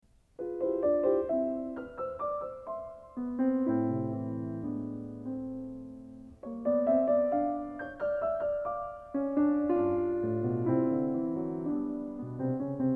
33 Piano Variations in C; Anton Diabelli

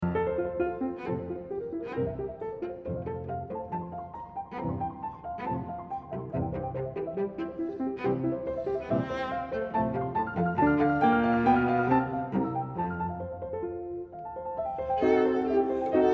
12 Variations for Cello and Piano

